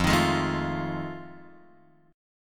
F 7th Sharp 9th, Guitar, Open F; F7#9 strummed; x,0,3,3,0,0

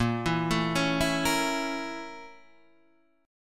Bb Minor Major 7th Flat 5th, Guitar, Open D; 8,7,7,7,7,8

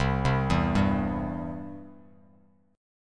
C Major, Bass Standard; arpeggiated; JGuitar

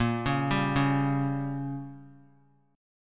A sharp Minor 6th; arpeggiated; JGuitar

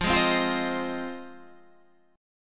F Minor 7th F Guitar Standard; Fm7 strummed

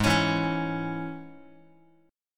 G sharp Suspended 4th Sharp 5th G sharp, Guitar; G#sus4#5; strummed; JGuitar

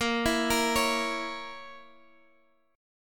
Bb Diminished Bb Guitar Half Step Down

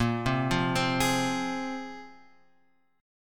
A sharp 7th Suspended 2nd; A#7sus2 Chord {6 3 3 5 X 4} arpeggiated

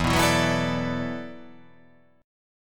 E 7th Suspended 2nd Suspended 4th, down

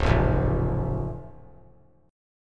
C sharp Augmented down, chord; strummed; JGuitar

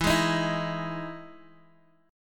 E Major 7th, Guitar, Open G; chord shape

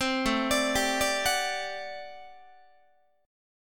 A Minor 13th A Guitar; Am13 arpeggiated; JGuitar

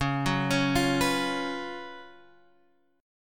F sharp Major 7th Suspended 4th C sharp

